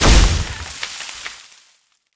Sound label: Veg Hit